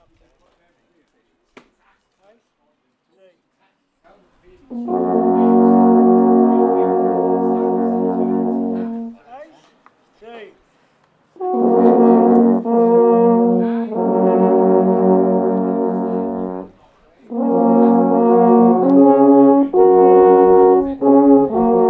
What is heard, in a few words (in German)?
Alphörner